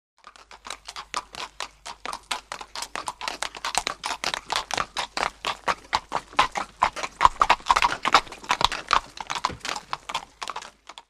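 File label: By Horse Carriage Slow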